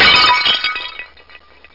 Close Buttons; DOpus; 3DaDiCt Theme

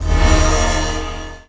level Start; Dreamstars

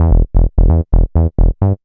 Z bass; Sonic Foundry; NY Dance II

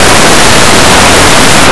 PAPER; Blue Heat

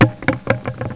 SOUND TIRE

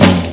tom tom, percussion instruments; Sound Effects for Multimedia - Volume 1

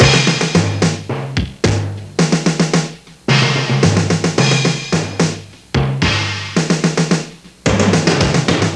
DRUMS; WARLOCKSNDS

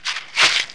restore up; wolfman

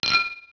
clink; Microsoft Graphics Studio; Home Publishing 99

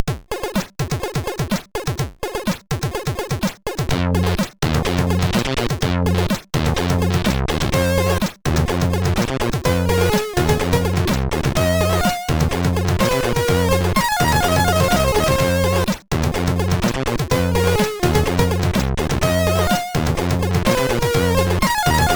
Noise Tune; SIDTunes

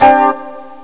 chuk fuzz sus2; Terra Sound Library; construction set; pads n strings

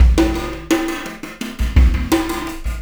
Event Horizon Beat, processed drum kits; Processed Drumkits: Zero-Gravity Beats; Sonic Foundry

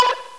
Score, Pinball Demo Music; 3D Ultra Pinball Demo

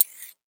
button; Immortal

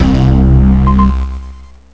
glove

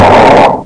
Rocks Off; Crystal Crazy Demo; Nasties; Rockhard

